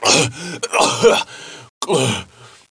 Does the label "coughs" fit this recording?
Yes